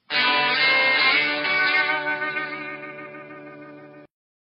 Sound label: guitar; jackass